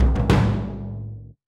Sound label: drum; bannerman